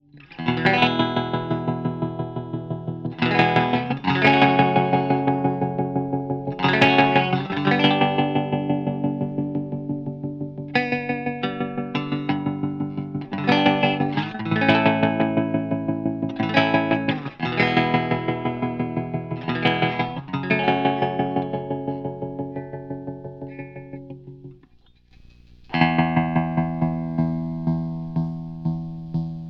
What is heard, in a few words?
trem-o-matic w- dearmond2k pups; ronsound